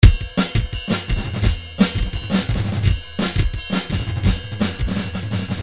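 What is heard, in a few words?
drums 170 cymbal; Paul Kodish Dangerous Drums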